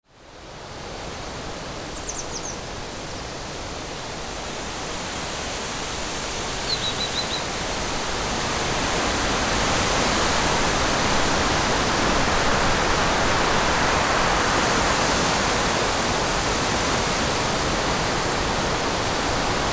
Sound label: windy forest ambience; nature